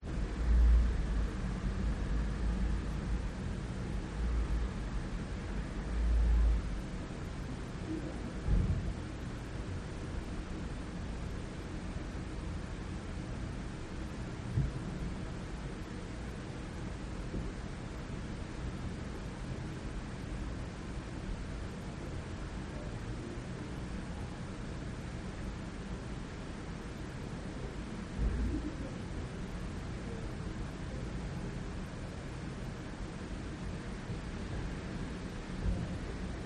stockholm roomtone flat day closed window ac hum calm street sounds behind wall